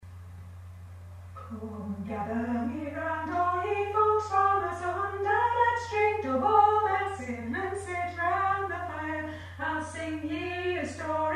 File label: SSAA; Magical Glass